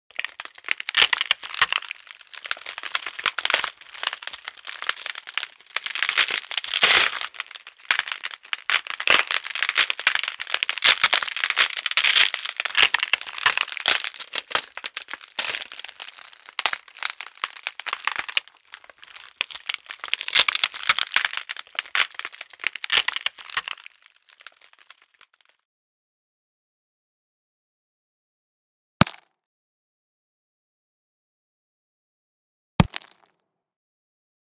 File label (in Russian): Tresk lda